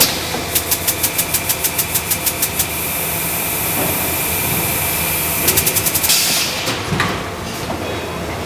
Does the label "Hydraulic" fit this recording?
Yes